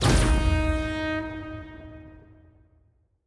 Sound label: goal sound